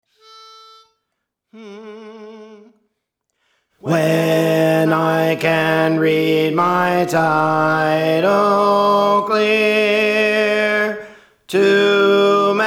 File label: Alto; Praise For The Lord Training